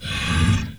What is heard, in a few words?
Dark Knight Attack